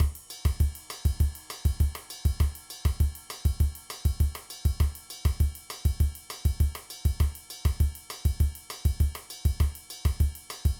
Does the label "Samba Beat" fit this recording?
Yes